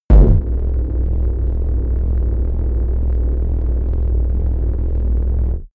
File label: space warp arrival; raumschiff